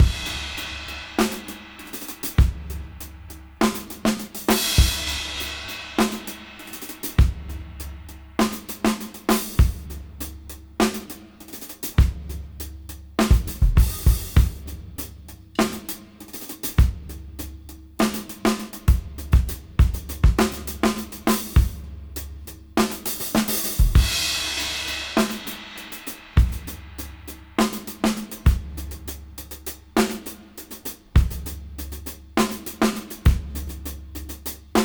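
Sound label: Half Funk 101 BPM Reverse; AMG - Keith LeBlanc Phat Butt Jams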